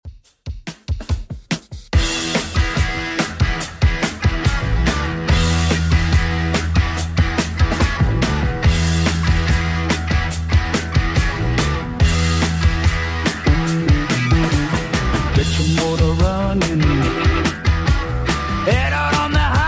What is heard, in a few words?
60s Redrum 143bpm; remixplanet